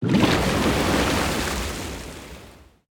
damage hits explosions

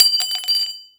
grenade launcher casing bounce

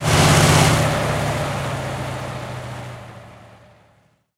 t90a engine load; engines; War Thunder

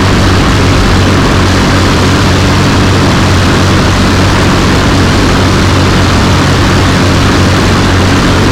Aircrafts Engines Jumo213A; DCS

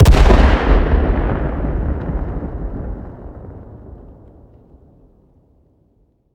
combat atmosphere; World of Tanks

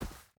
footstep boots snow run; Rust

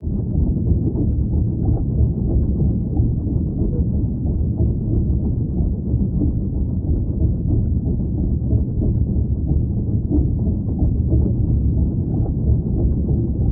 ship engines; World Of Warships

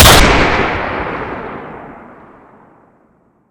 revolver 44mag fire; anaconda; wpnfire revolver 44mag mn

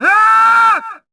aah

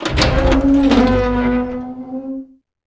door container push open; Call of Duty Modern Warfare; Doors

